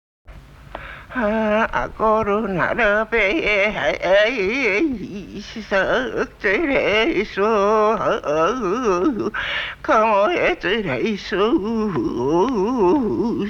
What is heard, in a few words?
Music of North Japan Narrative Poem